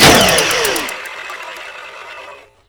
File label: snail gun wind down; tf2